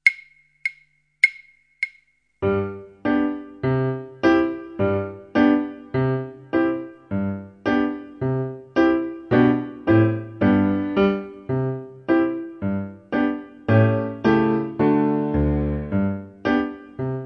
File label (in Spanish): Arco de violín piano